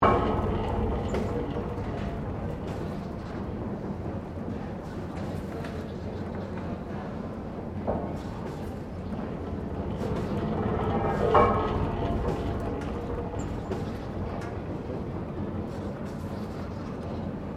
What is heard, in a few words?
PUMP BEAM PUMP OPERATING LOOP, Colonial era machinery; Ruston 1881; Machines